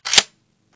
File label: bolt back, weapons, ak47